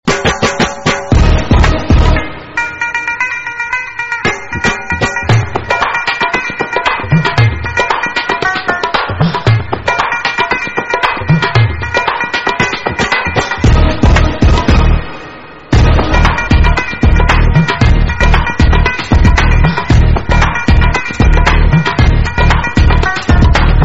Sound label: R N B